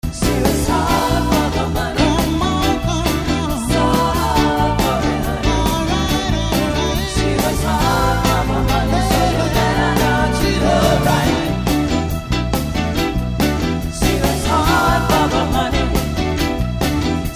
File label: Variety Band